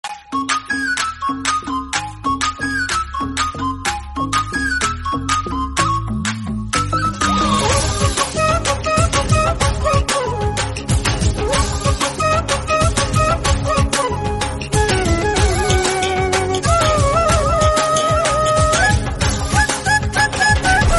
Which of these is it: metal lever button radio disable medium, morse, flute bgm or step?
flute bgm